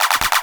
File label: Percussion, Trance NRG, Drums